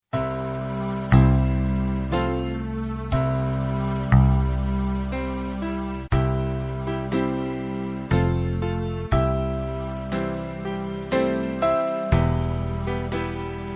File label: Playback Kumbayah; in C, 3 Verse, sehr langsam